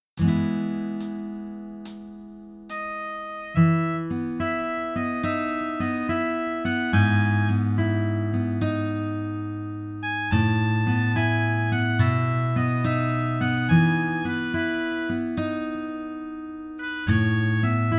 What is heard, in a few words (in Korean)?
Piano ver 멜로디MR